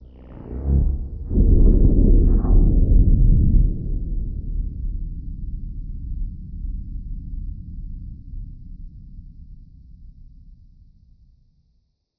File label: sparking distant